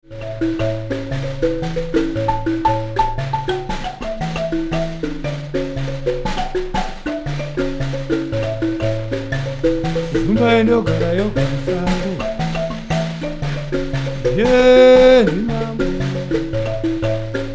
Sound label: mbira